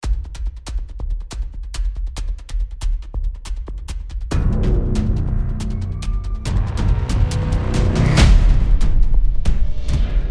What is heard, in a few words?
Ensemble Drums; Ari Winters